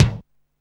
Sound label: Kick, Alesis Hr16, Drums